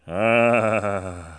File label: ahhhhhhh